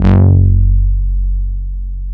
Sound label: DS8 F#1, Korg; Back In Time Records; Korg Universe VOL-1